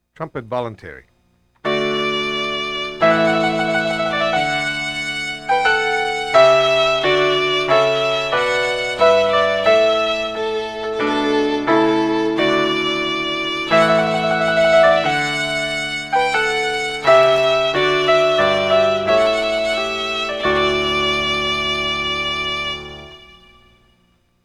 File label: Trumpet Voluntary